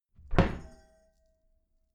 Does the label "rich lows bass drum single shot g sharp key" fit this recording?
No